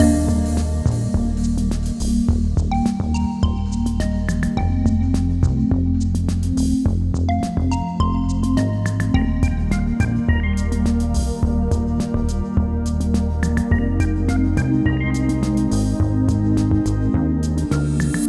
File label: Kids - C - 105bpm; color zen kids 3ds android ios mobile switch wii u gamerip 2013